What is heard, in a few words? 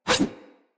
Large Dagger Whoosh Heavy; Snc Large Dagger